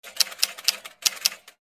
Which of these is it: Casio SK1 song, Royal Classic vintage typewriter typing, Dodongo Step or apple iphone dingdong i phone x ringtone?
Royal Classic vintage typewriter typing